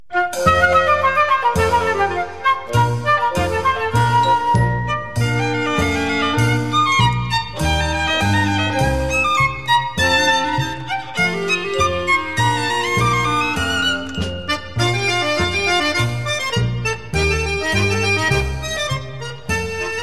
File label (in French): Marsj, Norvege